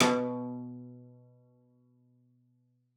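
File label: CELLO spiccato B2; 90 s Sample CDs; Xsample VOL-5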